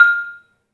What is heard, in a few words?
MARIMBA, F; 3D Sound; 3D Keys VOL-1